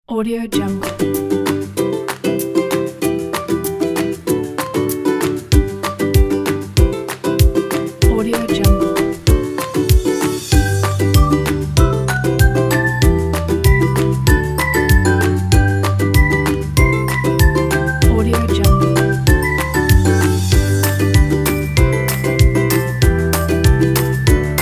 cheerful bird; soundparty

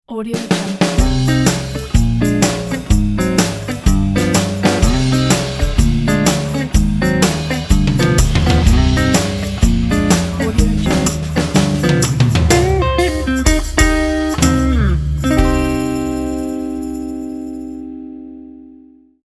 sneaking around ident

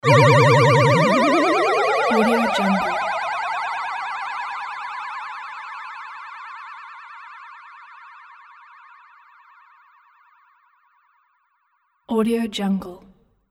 ufo flying away

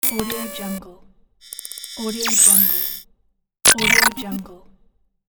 system volumetric glitch

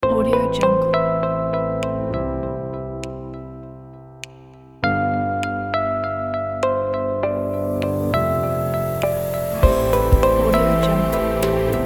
atmosphere ambient pack

